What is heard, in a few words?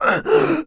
player breathe; PC Gamer IT CD 41; Daikatana